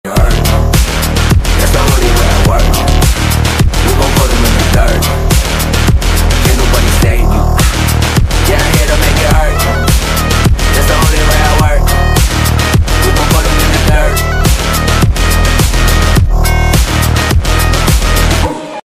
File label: bgm ringtone; aavesham